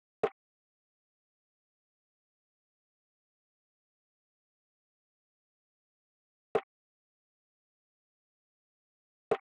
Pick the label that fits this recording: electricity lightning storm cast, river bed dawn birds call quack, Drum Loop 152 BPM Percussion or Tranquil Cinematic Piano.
Drum Loop 152 BPM Percussion